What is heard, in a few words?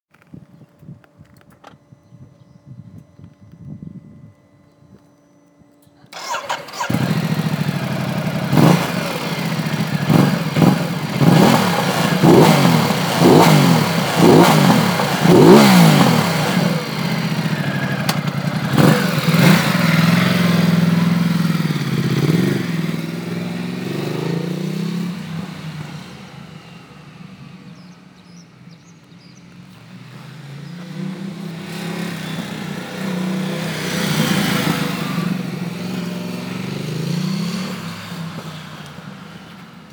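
Ducati Multistrada 1200 DVT, motorcycle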